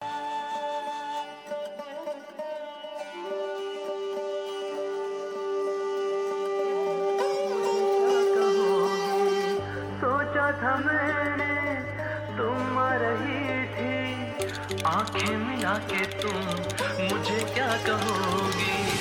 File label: Electronic